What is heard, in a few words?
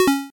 8bit se